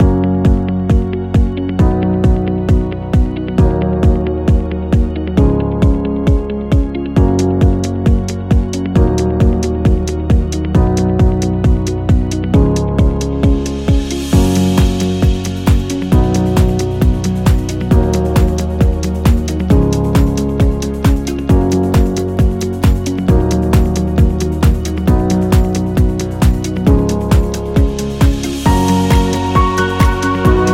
Explainer Presentation; friendly

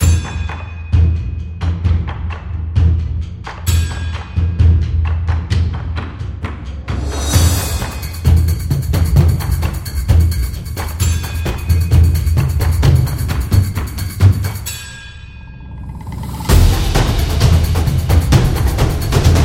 Japanese Drums

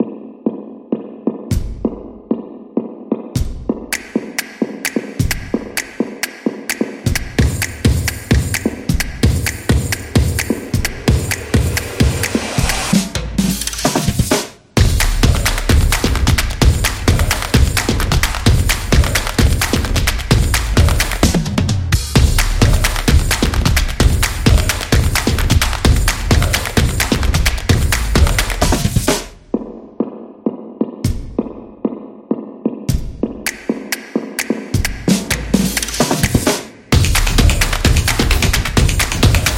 This Is Drums Dynamic Beat

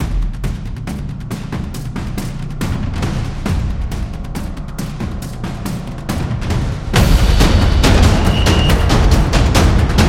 Epic Drums

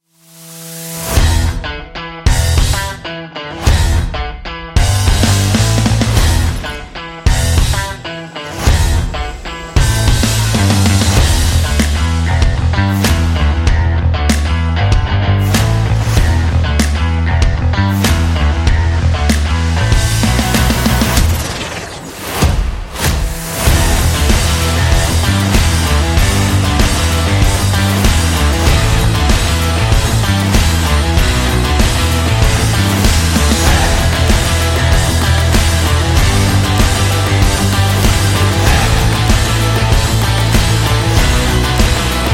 Driving Energy Rock; active rock music

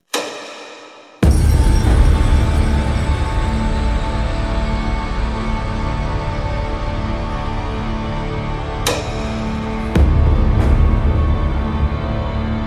Viking Battle, cinematic

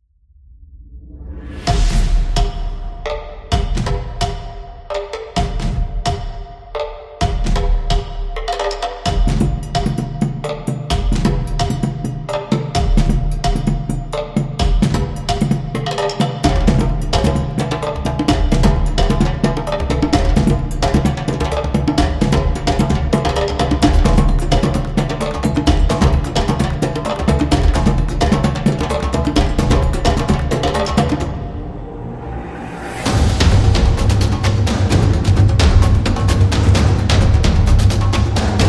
Africa Percussion

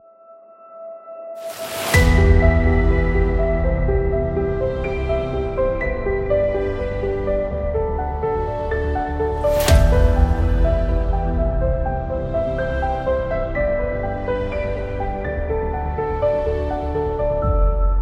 Epic Trailer Motivation; cinematic action

